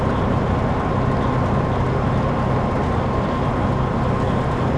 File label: ambi; Mafie 1